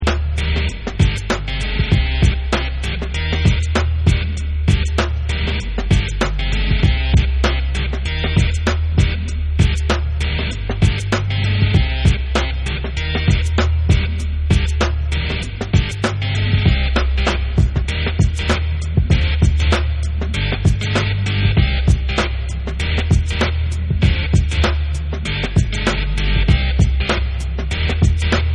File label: Instrumental Remix